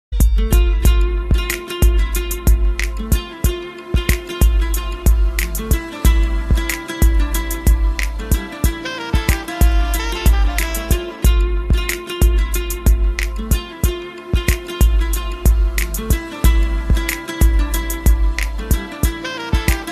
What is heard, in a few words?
Guitar mix